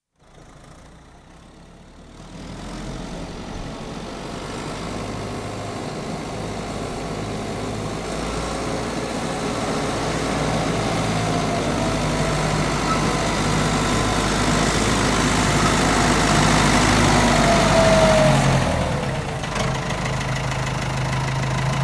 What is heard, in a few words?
Ford 7610 Tractor with trailer, exterior, approach, idle, departs (Close perspective recording); Tractor Sound Effects; BBC